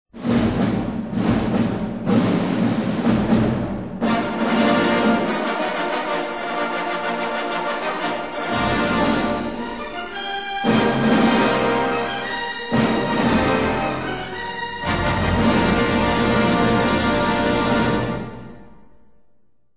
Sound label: with cinemascope extension